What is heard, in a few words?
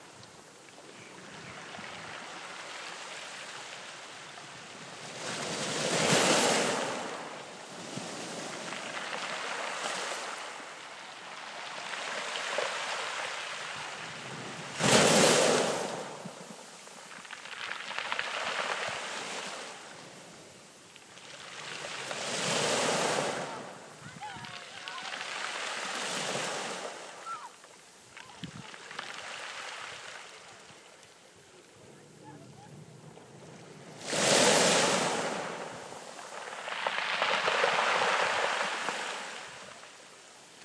waves and pebbles